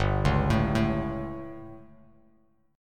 G Suspended 2nd Flat 5th G down Bass Standard, chord; Gsus2b5 arpeggiated; JGuitar